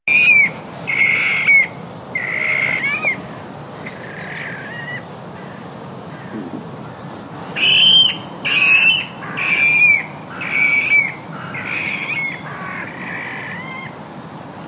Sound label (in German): Wasserralle; Kiessee GÖ